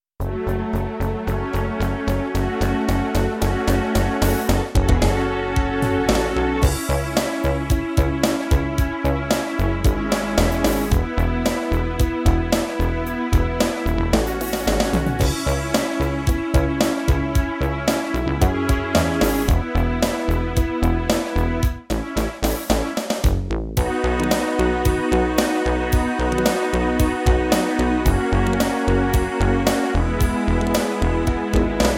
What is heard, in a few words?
Rock Pop Backbeat Bass